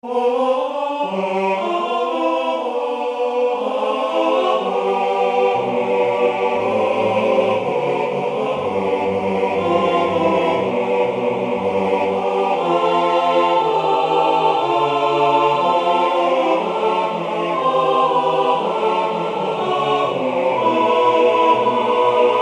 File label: satb, church choir; Original Compositions for Church Choirs